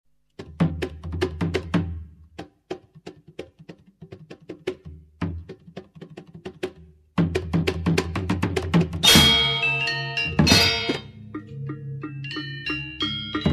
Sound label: gamelan semara dana